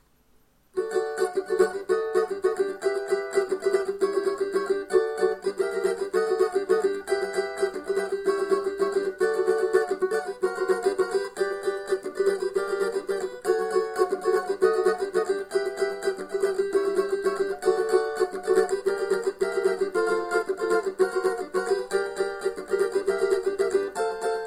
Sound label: cavaco